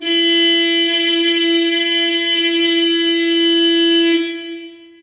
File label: horn car, street traffic; best service Blue Box